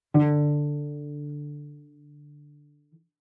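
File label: Cello pizz fortissimo sul D D4; MIS Pitches - 2014; Strings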